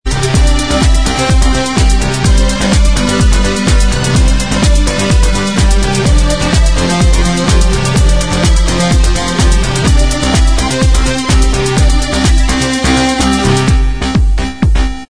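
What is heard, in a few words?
dance remix instrumental track, backing tracks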